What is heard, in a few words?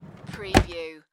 Ambulance Compartment; sound effects bible